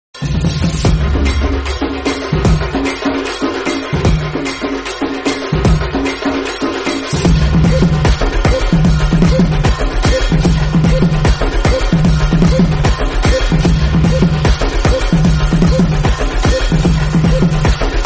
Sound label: drums music bgm; valimai